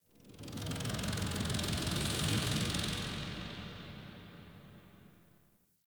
Creature Distant Low; Warframe; Infested Eris; Ambience; Outdoor

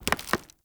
Foley Melee Swing; Characters; Enemy; Mockeries

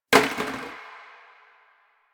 Janitor Plastic Bottle Water Drop

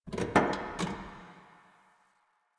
Parking Lot Car Door

